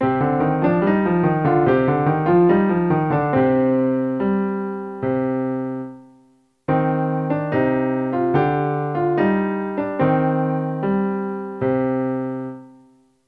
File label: practice dotted 8th note in cm